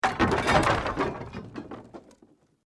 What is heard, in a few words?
Wood Collapse